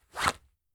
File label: Blinked Sleeve, Clothes